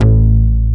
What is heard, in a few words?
fat bass; Dance; BASS SOUNDS; Masterbits; Dance Trax